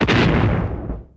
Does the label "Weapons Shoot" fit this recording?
Yes